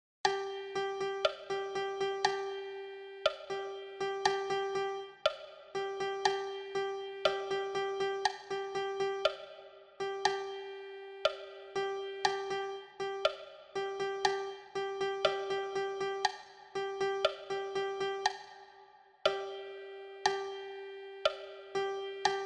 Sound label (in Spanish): percusion